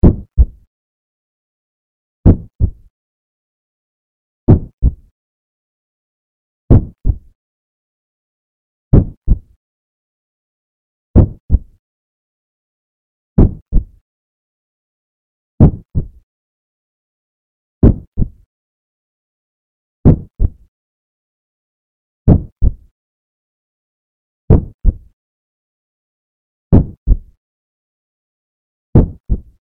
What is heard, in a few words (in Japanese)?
鼓動